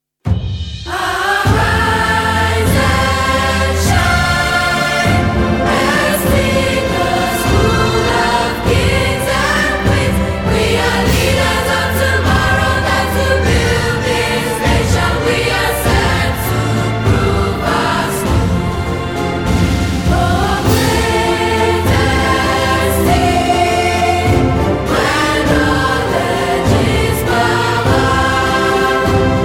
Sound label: school Anthem